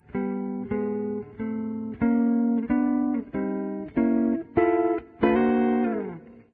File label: Skylight Music Intro; Diner Dash 2 Restaurant Rescue